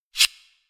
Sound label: delete